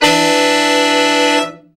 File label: BRA SECT; BRA SECTIONS